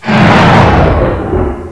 die; svencoop; aomdc; face

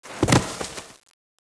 body drop; svencoop; sence; mistake coop

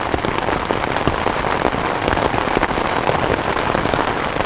buzzer rain dirt; svencoop; sence; mistake coop

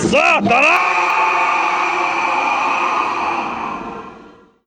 die; svencoop; morass beta; de man

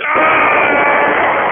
armorman die; npc; svencoop; morass beta

